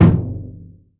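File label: brush bass drum hard; Northstar - New Gold VOL-2; 30S BRUSH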